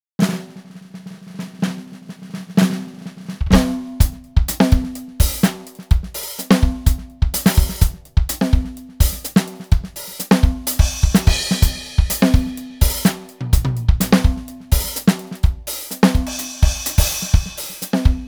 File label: vintage power; efnote 7